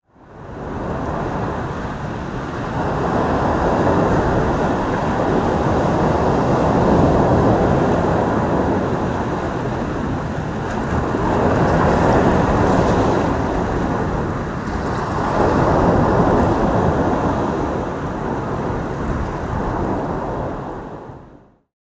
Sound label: Nature; Ambience